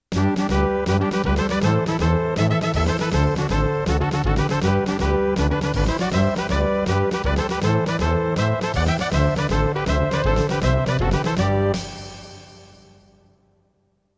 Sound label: Congratulation